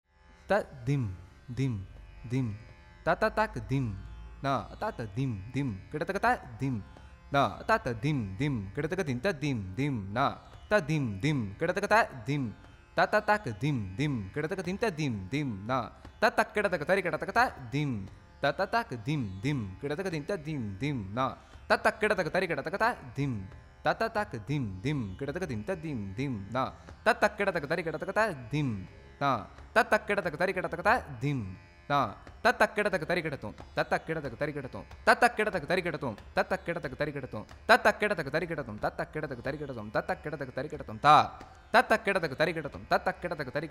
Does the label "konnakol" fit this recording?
Yes